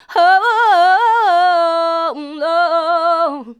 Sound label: Female vocals and robo-vox